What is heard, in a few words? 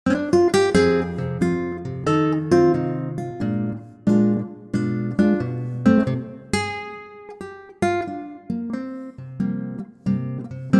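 F acoustic guitar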